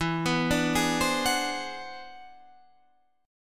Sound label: E 7th Flat 13th; E7b13; arpeggiated